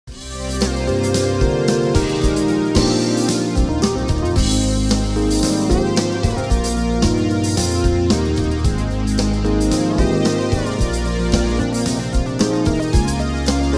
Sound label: Synth Solo